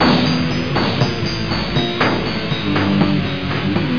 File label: metal loop